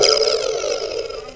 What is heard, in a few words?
click